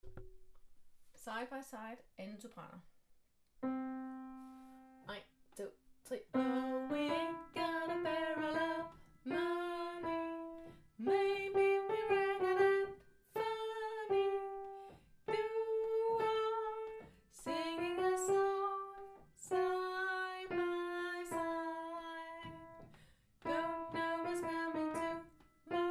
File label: 2. Sopran; harlev koret